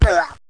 Vox Hit; Rabbids Big Bang; android

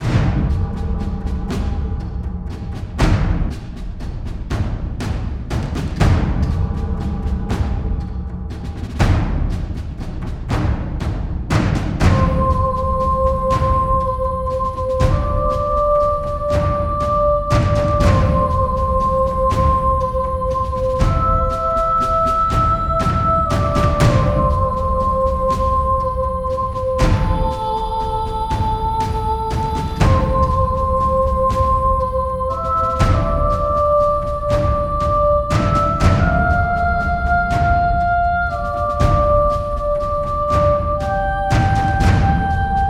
bossfight; Verlies II Verlies Origins

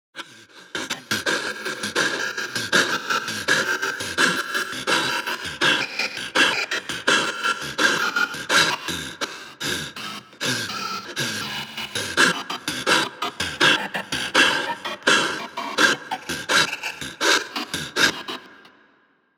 City Night Offices Loop Black Breathing Fucked Up; Get Even

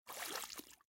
step in water

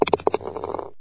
Ball Hole; Golf Blitz 1.12

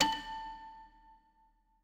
toy piano

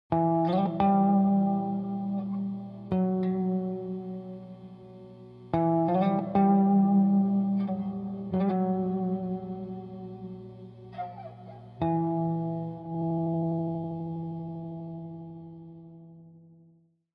Dark Guitar; Military City Intro Only First Statement

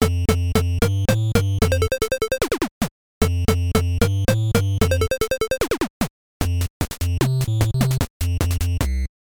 Game Start; Balloon Fight; nes

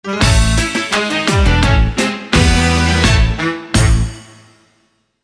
JINGLE LOSE; Super Monkey Ball 3D

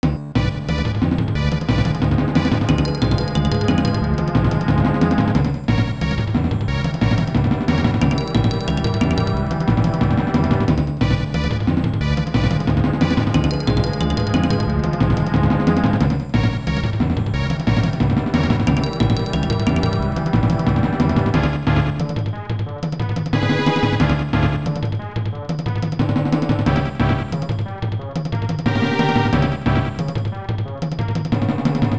Cave; lufia 2; snes

